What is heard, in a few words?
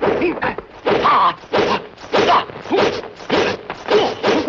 martial arts